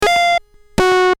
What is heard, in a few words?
NCC-1701 beeps, Prophet '08